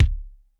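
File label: dry kick, dry kits; Crypton; DrumBase5000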